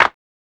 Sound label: clap, Claps